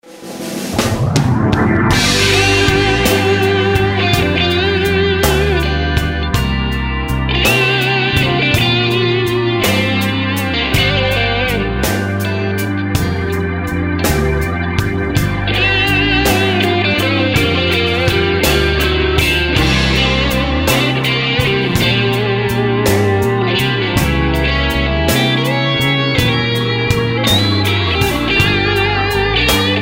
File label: slow blues, guitar